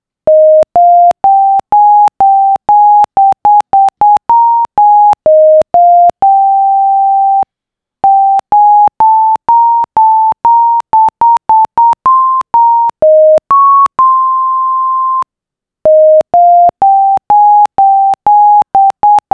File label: Hail Purdue 2x pitch